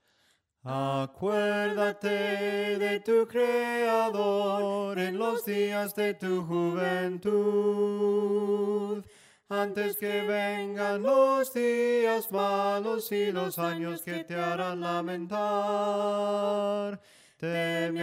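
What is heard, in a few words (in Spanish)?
SOPRANO CANTADO, himnos en melodia soprano; ACUERDATE DE TU CREADOR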